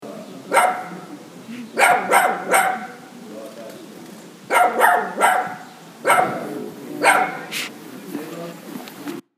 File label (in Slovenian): pes lajanje